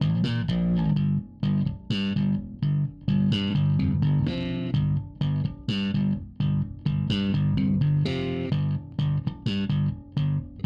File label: Bass DI Reamp